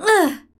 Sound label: Grunt; Lewd SFX